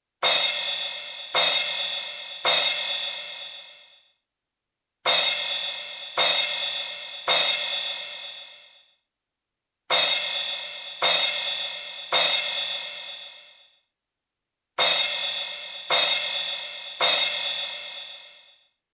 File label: Crash 3700Hz